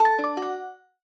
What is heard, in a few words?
click fx tonal